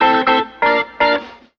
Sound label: organ party, Stabs